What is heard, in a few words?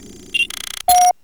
mail sent; ODST